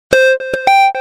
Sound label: Doot Deep